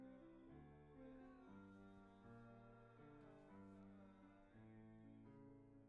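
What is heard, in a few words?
guitar B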